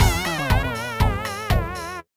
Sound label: Lose